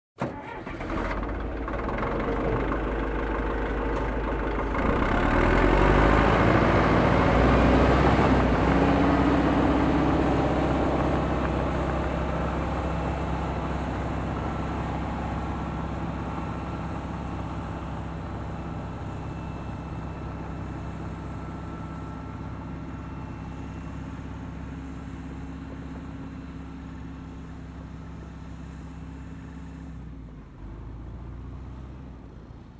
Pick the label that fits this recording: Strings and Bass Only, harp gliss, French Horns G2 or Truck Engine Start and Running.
Truck Engine Start and Running